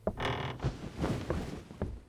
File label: Bed Exit